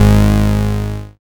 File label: BAS MKS-50, Roland